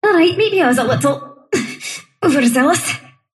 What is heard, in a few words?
sylph death; dota; dark willow